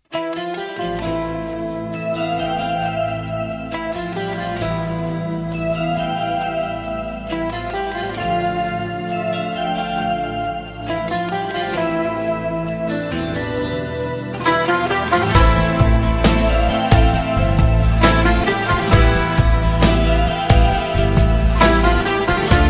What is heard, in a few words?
Sad Music Ringtone